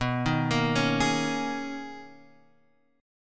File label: B Major 13th B down Guitar Half Step Down